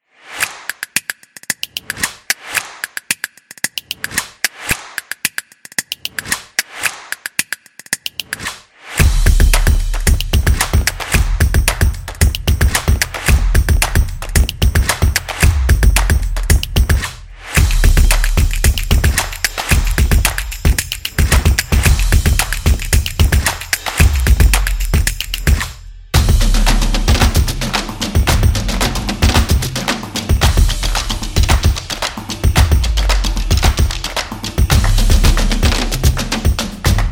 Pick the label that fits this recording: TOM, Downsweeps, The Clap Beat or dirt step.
The Clap Beat